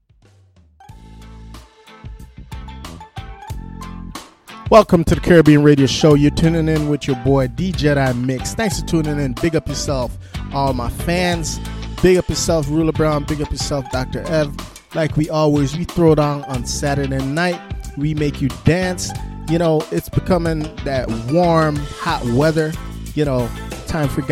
hip hop mix

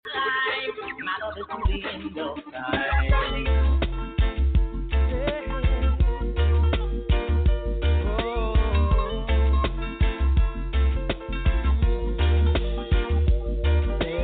reggae gospel praise; wednesday night

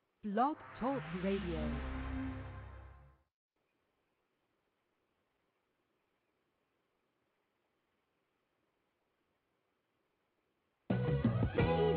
hip hop new music blast

